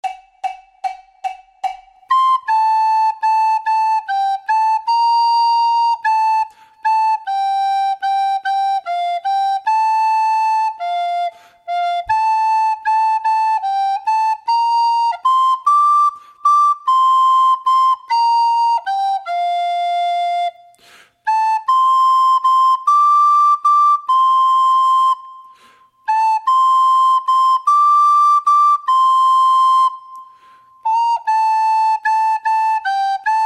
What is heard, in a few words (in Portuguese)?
FLAUTA SOLO